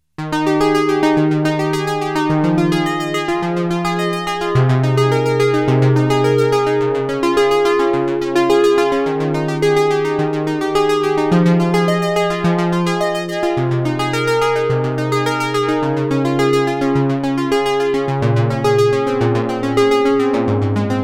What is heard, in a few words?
P600 Pan Arp